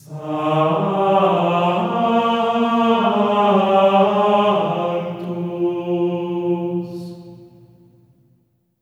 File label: SANCTU D#4; Spectrasonics; Symphony of Voices; GR SANCTU